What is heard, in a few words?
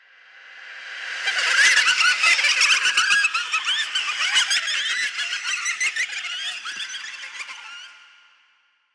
inferno children, ambient; Counter Strike; CS2